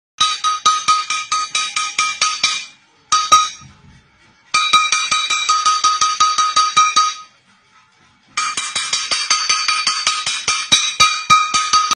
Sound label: hitting pan with spoon